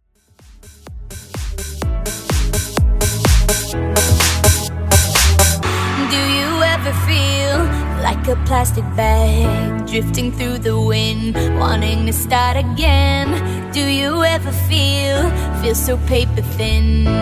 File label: House